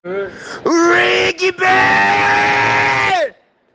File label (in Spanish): grito; rigby